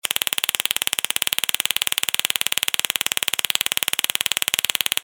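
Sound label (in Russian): звук электрошокера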